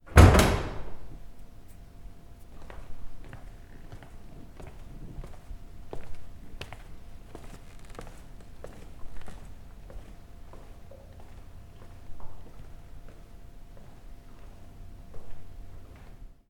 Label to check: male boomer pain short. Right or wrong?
Wrong